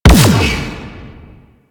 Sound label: Capital Ship Turret Fire; Warframe; DE; Vehicles; RailJack; RailJackEnemyTurret